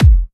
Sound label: BD Trancy; Club; VEC1